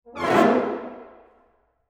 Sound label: tripped up brass